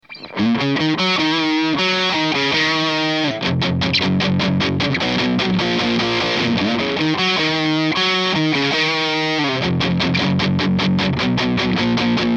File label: quick rhythm